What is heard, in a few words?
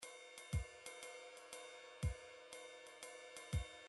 drums, midi drums